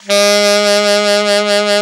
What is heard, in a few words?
ALT SAX, D3; Best Service; Alpine Volksmusik